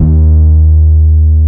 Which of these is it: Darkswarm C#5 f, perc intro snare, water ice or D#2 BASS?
D#2 BASS